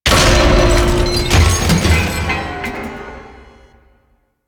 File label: Metal Debris Impact; Mission Two; Warframe; The New War